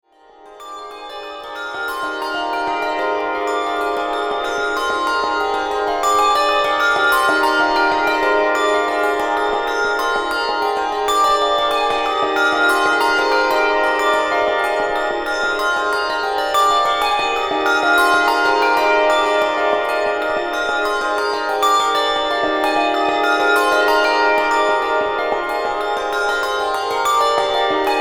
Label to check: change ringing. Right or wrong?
Right